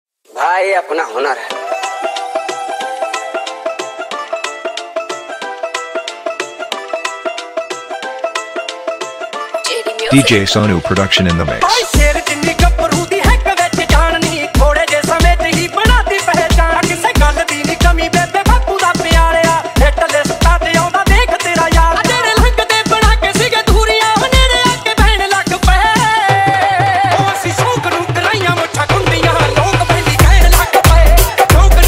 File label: Dhol Remix Song